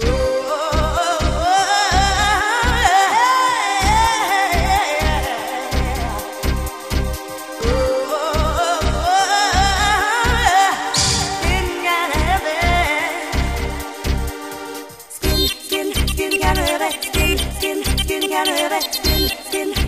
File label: vox heavy